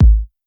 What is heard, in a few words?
kick, house